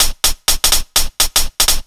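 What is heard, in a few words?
125 drum 2 R, Fresh Disco House; USB Soundscan vol.20; AKAI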